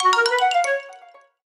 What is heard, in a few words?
notification